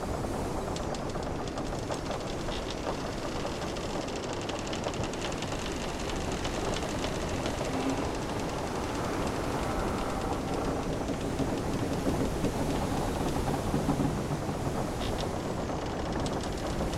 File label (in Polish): statek morze